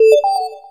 call connect; Samsung SGH-i300

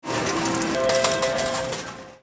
shutdown; Nokia; 6270; Casino

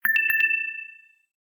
Low Battery; LG; SU760 Optimus 3D

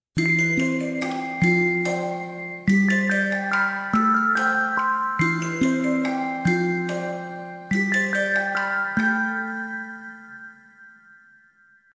Rain dance, ringtones

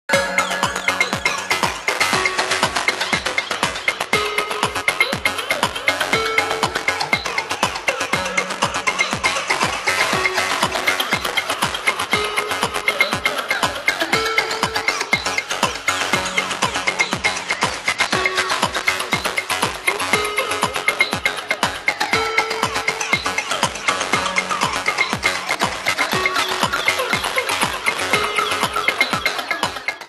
Ring Electro Electro; HTC Touch Diamond

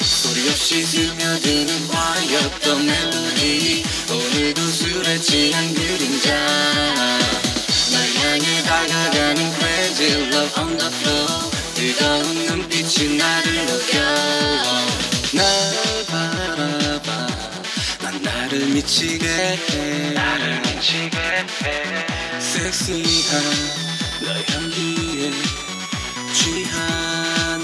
Melting, ringtones; Samsung; Galaxy A SHW-M100S

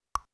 Open, Keytone sounds; LG GD550 Pure

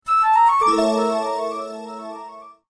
Arabic Flute; LG KP500N Cookie MEA; Message tones

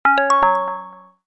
close; LG KH5800

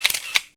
Shutter; SKY IM-S400L; Camera; Shutter Sound

